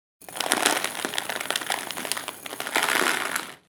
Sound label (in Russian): zvuk peresypanija